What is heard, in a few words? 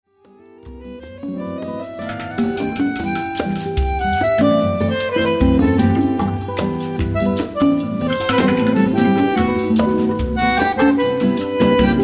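bandoneon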